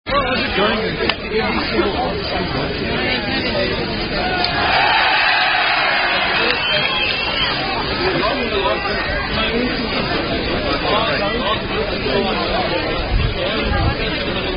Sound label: School Song And War Cry, Rugby Song; S.A.C.s School Song And War Cry